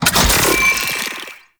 Sealed Cache Activate; Warframe; Zariman

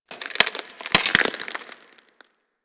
Ocean Glass Crack Small; Warframe; Grineer Ocean

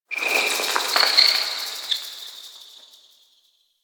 Interior Cave Critter; Warframe; Ambience; Duviri

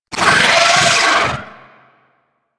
Amalgam Moa Satyr Vocal Death; Warframe

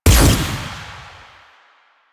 Fire Shot; Duviri; Incarnon Adapters; Incarnon Dex Sybaris; Weapons

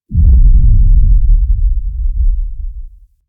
Undercroft Sub Sweetener, Ambience; Warframe; Duviri

